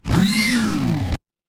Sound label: mechanical servo